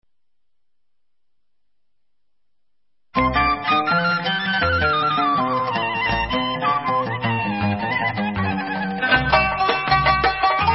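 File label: Instrumental, Israeli Dances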